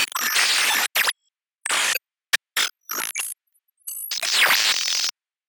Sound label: Glitch; Kyutatsuki